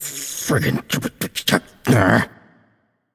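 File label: nonverbal grumble; voicepack; smite; merlin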